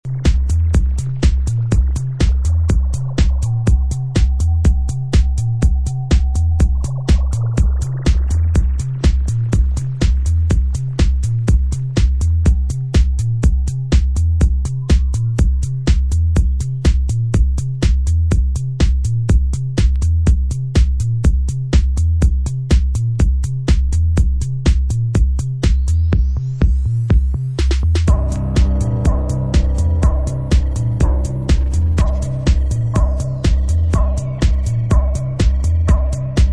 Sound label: bass tilt; Techno